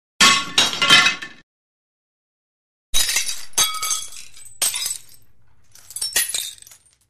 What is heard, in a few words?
Glass Drops & Rattles Light Glass Drops & Hits Floor; Hollywood Edge Foley Sound Library